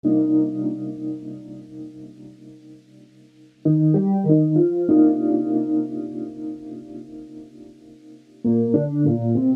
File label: Keyboard; Sonic Foundry (Sony Creative Software); Infinite Soul VOL-1